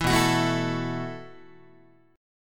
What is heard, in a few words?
B 7th Suspended 4th B down Guitar; B7sus4; strummed; JGuitar